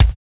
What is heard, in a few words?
DANCE SET, DRUMS